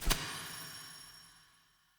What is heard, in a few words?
UI Default Map Marker Select